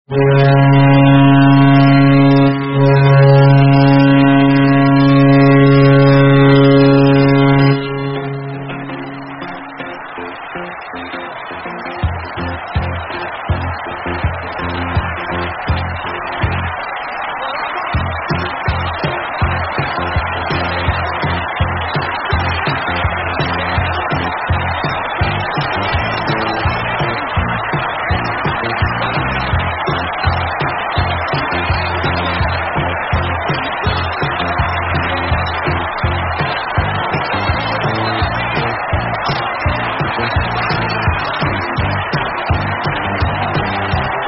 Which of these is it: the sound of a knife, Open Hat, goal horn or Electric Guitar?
goal horn